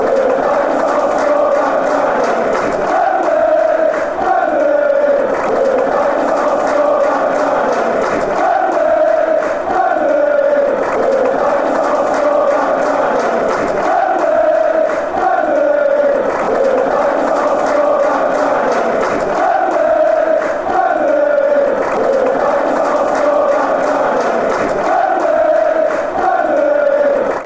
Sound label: wembley wembley, fan chants